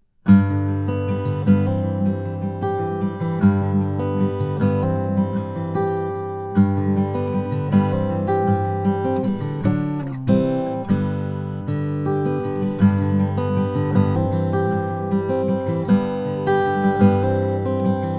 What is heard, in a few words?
guild f30k; master grade